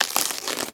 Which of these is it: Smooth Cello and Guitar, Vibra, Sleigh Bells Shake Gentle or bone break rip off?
bone break rip off